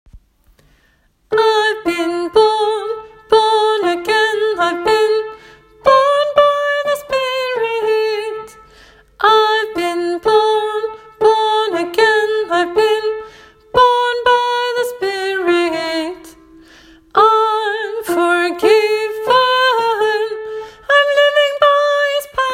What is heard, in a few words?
tenor; åfjord